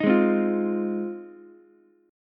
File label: G sharp 6th, Ukulele; strummed; JGuitar